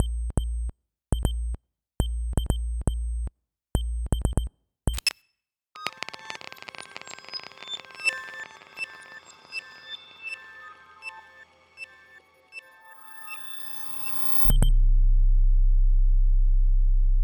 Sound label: morse